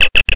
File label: message